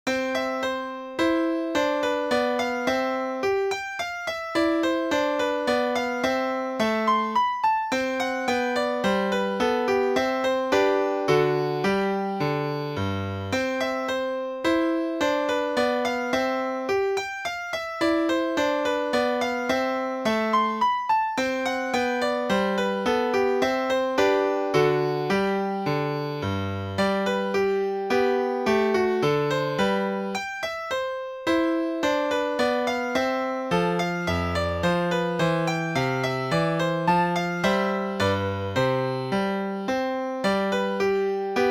Minuet

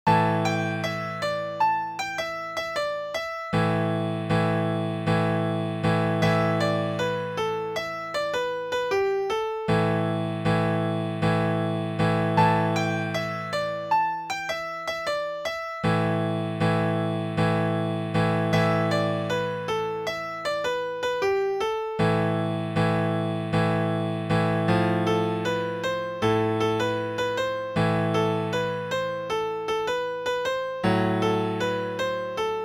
Klavier

